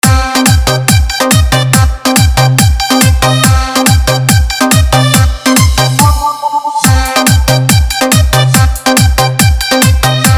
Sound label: Bhojpuri Remix Song